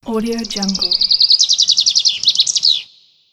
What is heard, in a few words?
birds